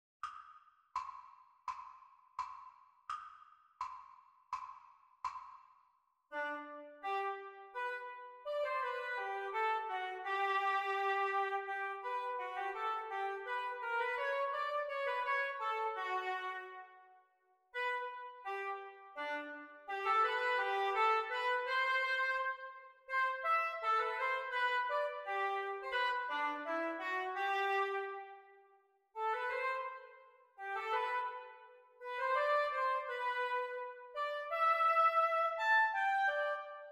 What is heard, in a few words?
Oboe Duets